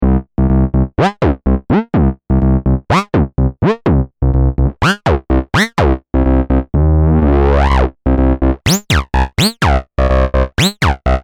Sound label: Acid Filter + Distortion